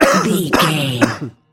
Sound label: voice male coughing